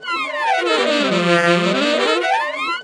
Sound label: Sax Section Effect, effected sax section; Sonic Foundry (Sony Creative Software); Jazz Solos and Sections